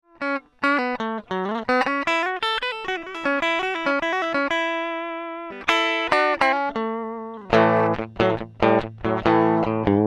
Clean

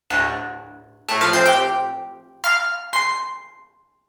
Sony DRE 2000 Modal Reverb B

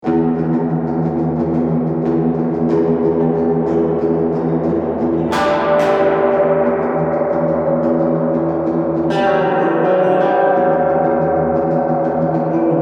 Texture LINE

